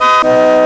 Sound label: car horn; Who What When Where